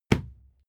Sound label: fight impact sweetener cloth muffled; nahkampf; soundpool